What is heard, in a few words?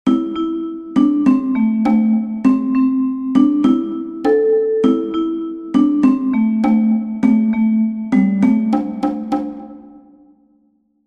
Cajon; My paddle s keen and bright